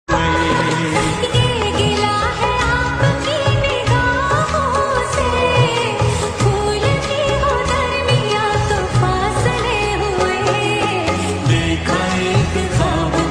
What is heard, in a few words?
lofi song